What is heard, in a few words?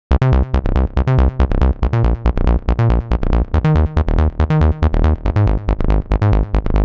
140 House Bass Cmin; Digidesign; Pro Tools; Pro Tools Essential Loops; Dance DJ